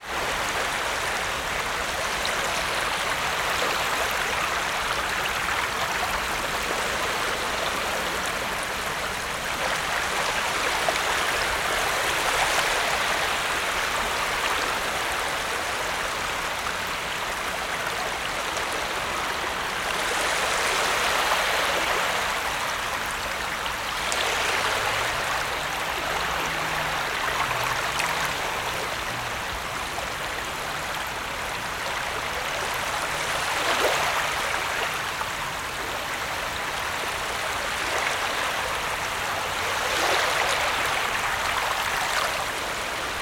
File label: water ocean waves small constant sand shallow incoming tide birds distant boat